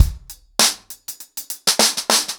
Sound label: 100BPM, ACOUSTIC DRUMS